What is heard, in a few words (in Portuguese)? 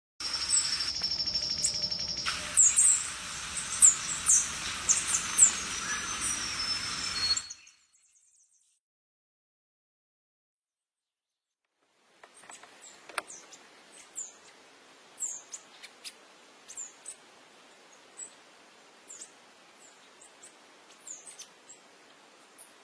canto da saira lagarta